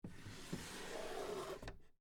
Drawer Open, Foley, Drawer, Open, Antique, Wood, Table, Small; Drawer Sound Effects